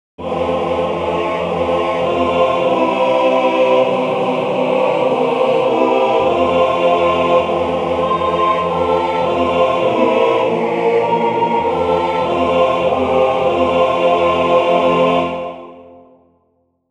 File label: Happy Birthday bar